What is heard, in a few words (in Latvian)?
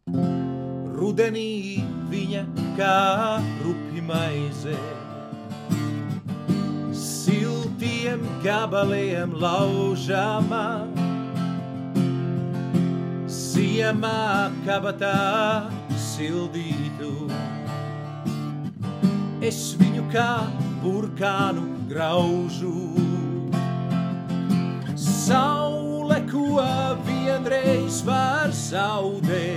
ar gitaru; Engure; Saldus saule; engure dziedi